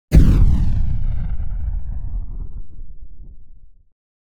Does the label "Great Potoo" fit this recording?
No